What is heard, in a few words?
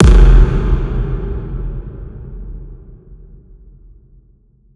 Impact; Singomakers; Infected Psytrance; Impacts